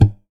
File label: Classical Guitar Extended Technique